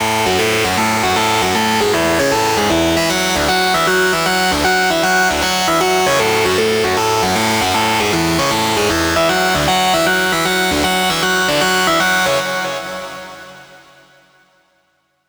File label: lead loop F#min 155; Music Loops; GhostHack